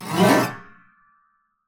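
Piano Metal Mallet Gliss Mid Ascending Legato Damp; InspectorJ; Piano Mallet Strikes and FX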